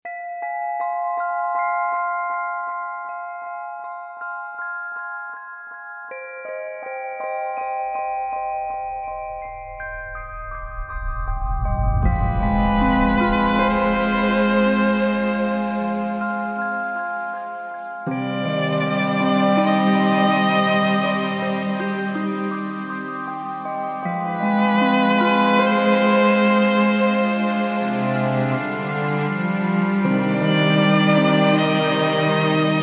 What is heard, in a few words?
Epic Fantasy Trailer